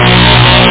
shock; Super Games Pak (1991)(Odyssey)

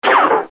laser; PaxImp; Guns

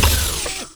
door close; progen

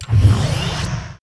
Pickup Goody; swarm

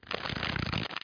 card shf; Infobase

